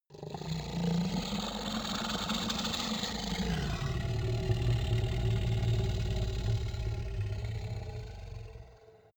minotaur grunt long; kreaturen

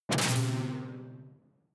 sci-fi alien splash detuned; swooshes-swishes

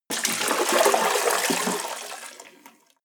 toilet flush

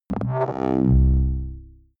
click ready boot

